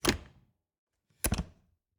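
Refrigerator, old fashioned, wooden, steel, brass clasps, hinges, open close, quick; Eiravaein Works; Vaeyan IV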